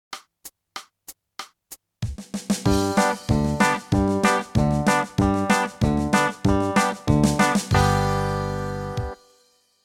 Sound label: country